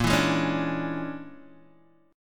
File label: Bb 7th Sharp 9th Flat 5th Bb, Guitar Open G; x,3,2,1,2,0